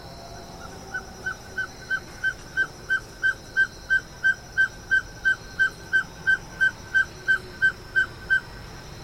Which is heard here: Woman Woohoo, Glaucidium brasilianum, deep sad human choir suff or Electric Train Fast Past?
Glaucidium brasilianum